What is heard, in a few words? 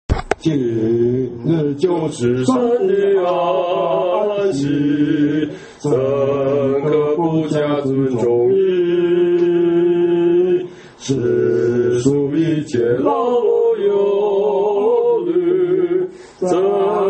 hymn; worship